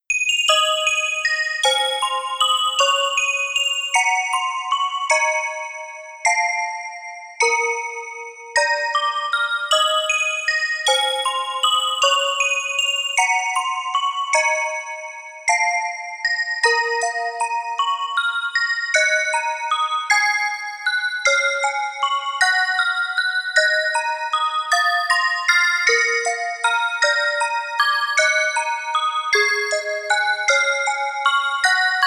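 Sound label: music box; Kakyuusei; Orgel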